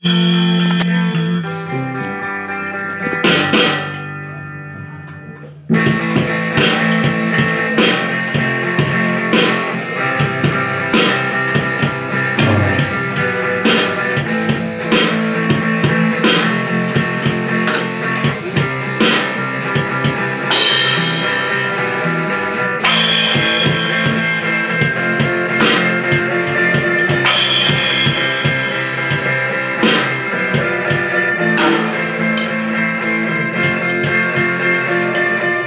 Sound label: drum song